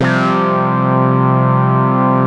QUACK CHORD; InVision Interactive; Keith Emerson; The Most Dangerous Synth and Organ

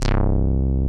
DRY BASS; Masterbits; Dance Trax

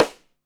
DEEP STIK, SNARES; Back In Time Records - Big Bang

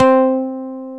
SMA, synthesizer bass; VFX-SD

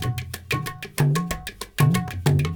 INDIA L; Spectrasonics - Heart of Asia CD2

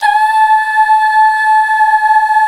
Duh Fem, CH BACK; Best Service Gigapack 1

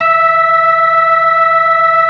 B3 E 3; Best Service; Advanced Media Trax Modern Composer; B3 LES 1

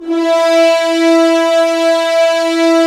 Horns; Roland - LA Composer vol. 4

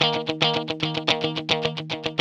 GUI, 110 BPM

